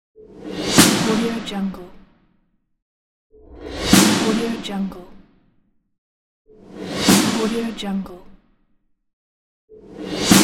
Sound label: army drums hit